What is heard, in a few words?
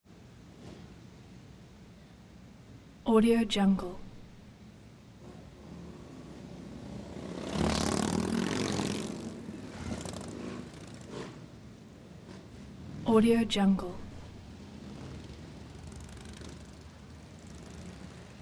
group of harley davidson motorcycles approach engine rumble